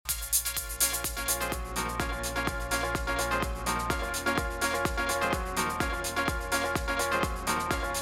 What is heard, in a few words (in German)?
Reloop RMX 10BT nur Mitten